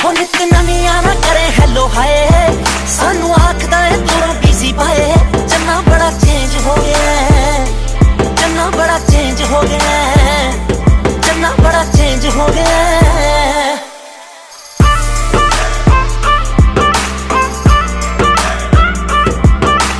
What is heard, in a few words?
hello hi ringtone